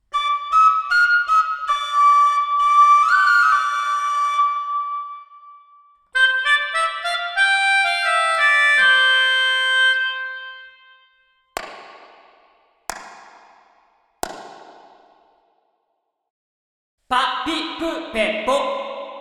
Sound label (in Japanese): チェンバー：Studio 2, ダークで温かみがあり、重心が低いサウンド。密度が濃く、包み込むような響きが特徴; Studio 1に比べるとダークで温かみがあり、重心が低いサウンド。密度が濃く、包み込むような響きが特徴。